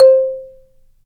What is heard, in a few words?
Marimba; Roland LCDP11; Africa VOL-1; Af.Marimbas